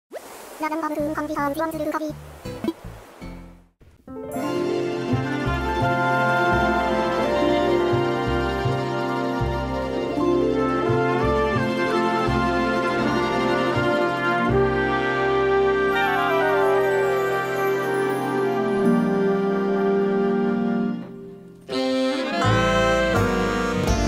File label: Moody Big Band Version; Animal Crossing